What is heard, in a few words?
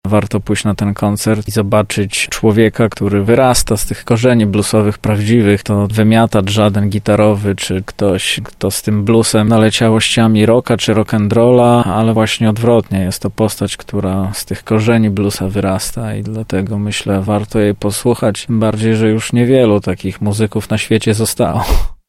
jazz blues session